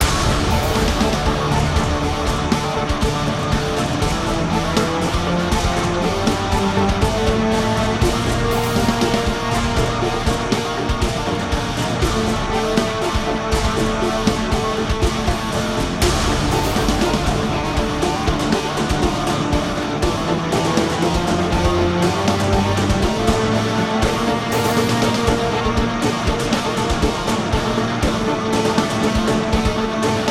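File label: Music Drive; Metroplex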